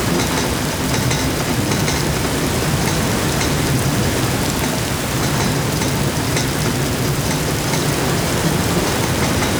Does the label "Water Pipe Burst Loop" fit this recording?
Yes